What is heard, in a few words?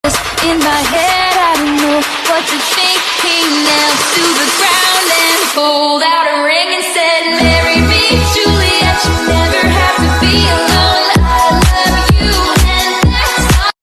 Disco Ringtone